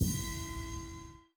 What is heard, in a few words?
dream orb pickup